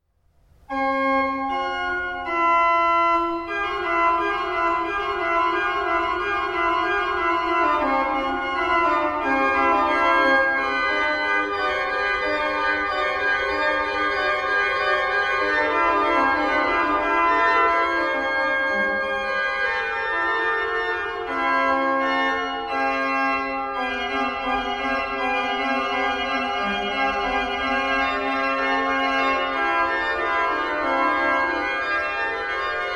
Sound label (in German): fuge c-dur, orgelklang; nikolaus vetter